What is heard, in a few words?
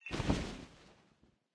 cloth; smite; chaac